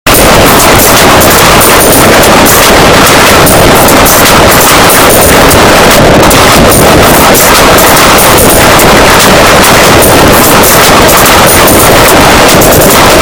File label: earrape; gta iv